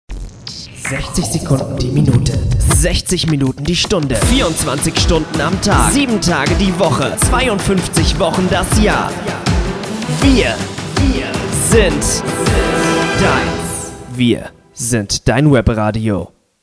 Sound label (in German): Jingle Wir sind deins